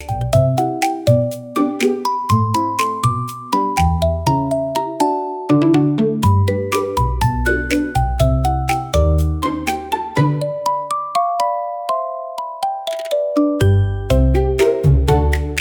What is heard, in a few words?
loop